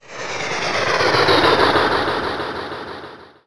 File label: ufo; Aliens; DarkBasicPro